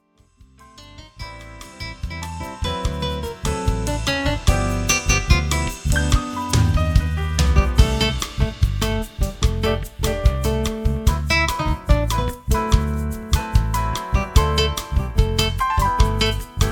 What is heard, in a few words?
Samba